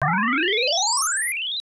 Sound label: enemy death; Bubble Bobble Remake